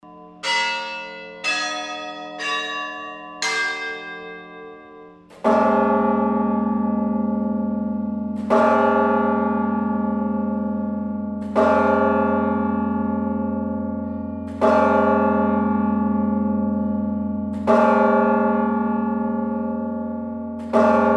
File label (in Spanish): Campanas Catedral